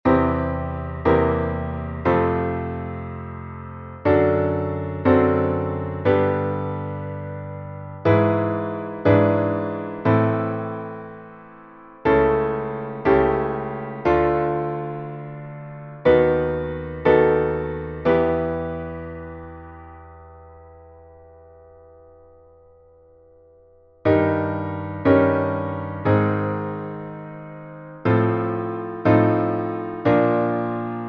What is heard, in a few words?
Extended Dominant Motion - Bass Clef Patterns